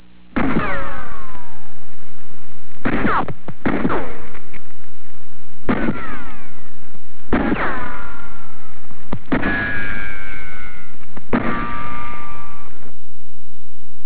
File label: RIFLE